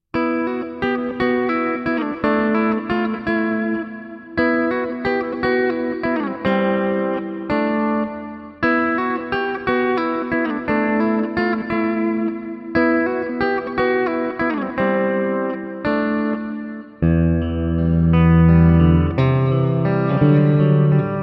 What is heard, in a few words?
Acoustic; KPA